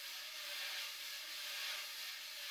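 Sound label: slow brush; Northstar - New Gold VOL-2; 50S BRUSH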